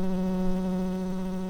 bumblebees, Hypnorum workers

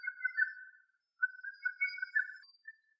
Lesser cuckoo

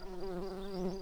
bumblebees, Hypnorum workers

